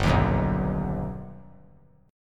G Augmented Major 7th, chord; G+M7 strummed; JGuitar

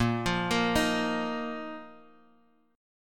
Bb Suspended 4th, Guitar; Bbsus4 arpeggiated; 6,8,8,8,x,x; JGuitar

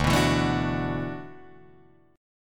D 6th Add 9th D, Guitar, Drop D

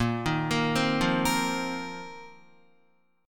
Bb Suspended 2nd Suspended 4th, Guitar; arpeggiated

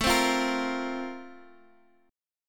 F Suspended 4th A; Fsus/A strummed; JGuitar

